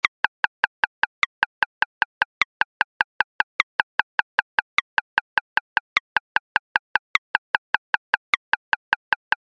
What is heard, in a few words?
poly 6 taps